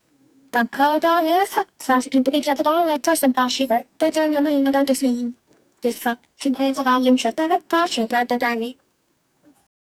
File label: royal indian history logo